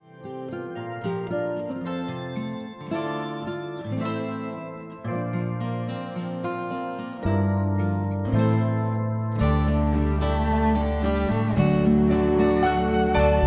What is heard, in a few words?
Accompaniment